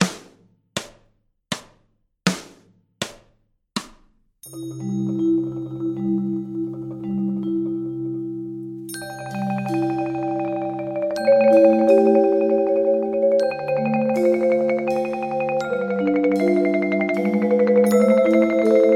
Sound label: Berceuse 80bpm